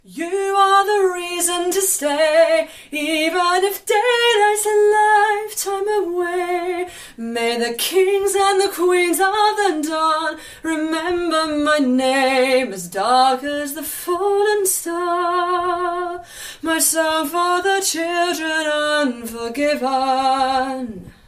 Registers

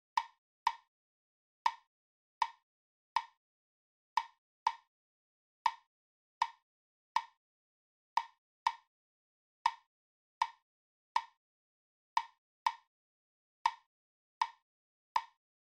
3-2 Son clave

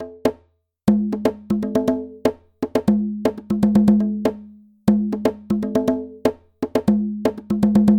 World Conga

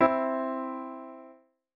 Major Third

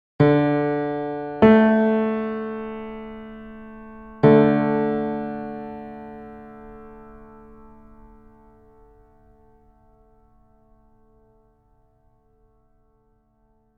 Modal Improv

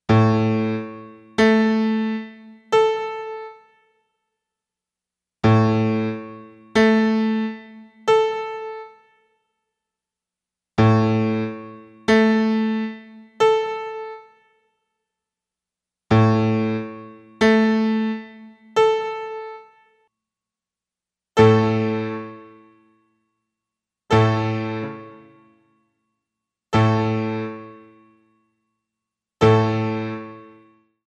piano

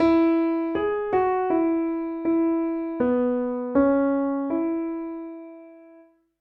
melody

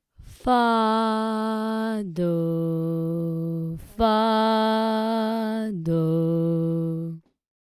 Fa Do in E Minor

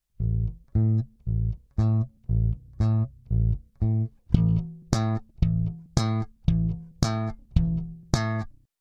Electric Bass Finger Then Slap Single Note; bassists

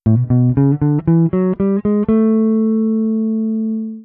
The Bebop Dominant Scale

